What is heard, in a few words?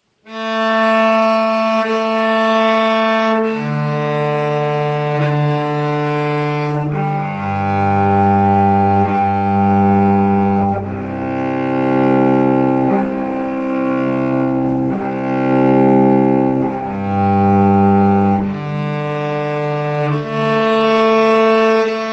cello